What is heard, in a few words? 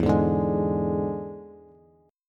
E 7th E down Bass